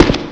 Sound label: slasher ghost step